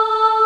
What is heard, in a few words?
Level Up!; SNES; Seiken Densetsu 3